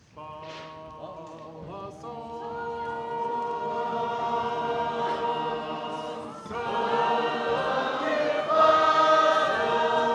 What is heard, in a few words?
Chester, sacred harp